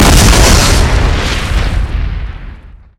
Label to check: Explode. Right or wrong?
Right